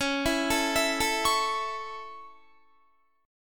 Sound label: Db Minor Sharp 5th Db down Guitar; arpeggiated; 4F,4A,5C,5G,5C,6E; Dbm#5